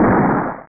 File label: Xplosht; DX-Ball